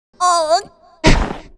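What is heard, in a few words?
Npc Ship blue harpy damage Die; Fly For Insanity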